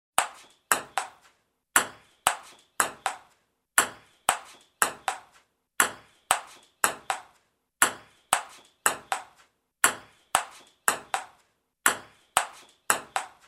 The Ping Pong Sound

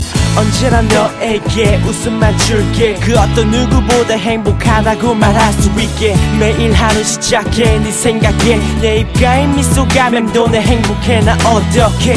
Rap

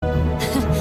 Laugh; Brigitte

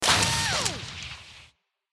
Click Msg

